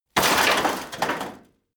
metal rock impact multi

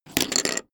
poker chip stack small fall down hard

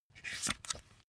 magazine flip back

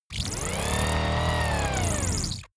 servo rubber rev up

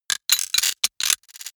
robot rustle click

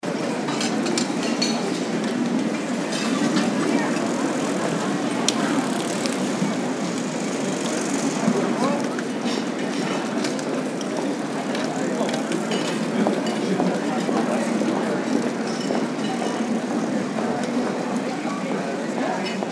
street cafe

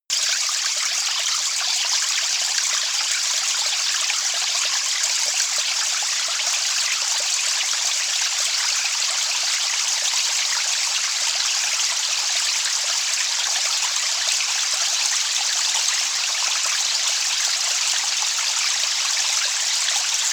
atmosphere bubbling water

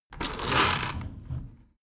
window roller shutter open soft single pull

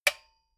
metal button stereo spring push

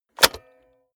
cassette player slide tray insert medium

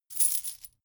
coins handling many

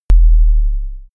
sub effect

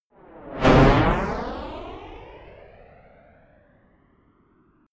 rocket hydra flyby whoosh fast close

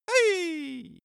cartoon male jump

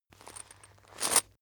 chain mail movement

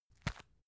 tissue package put down

